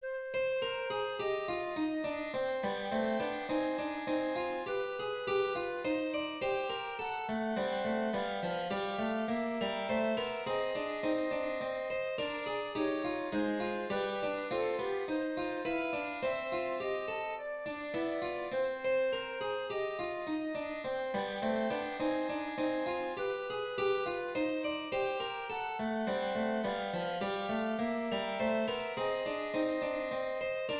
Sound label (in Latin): canon motum contrarium; Jean Sébastien Bach